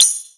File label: Tambourine